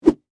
Whip Whoosh, Foley, Whip, Whoosh, Large, Dowel, Rod; Whips; Objects; BlastWave